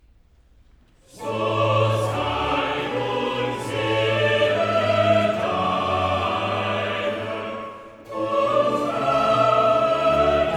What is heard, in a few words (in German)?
Choral; Cantata, BWV 13 'Mein Seufzer, meine Tränen'; 'So sei nun, Seele'; Bach; Greenwich; 2nd Sun After Epiphany